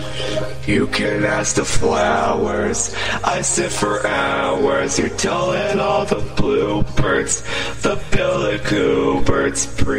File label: autotune